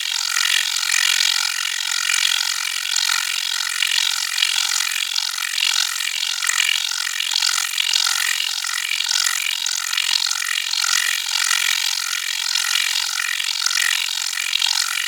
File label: Laser Grid Loop; Warframe; Corpus